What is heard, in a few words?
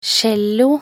cello